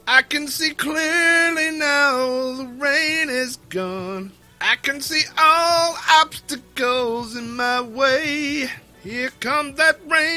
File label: bacon rain